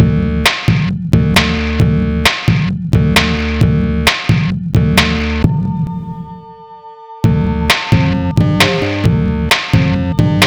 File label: SINEWAVE